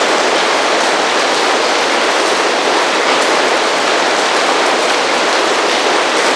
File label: water flow cave inside